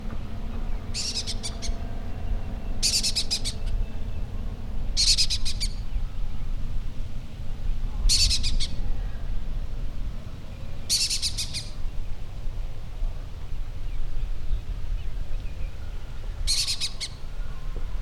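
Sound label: stepnaya pustelga voice, lesser kestrel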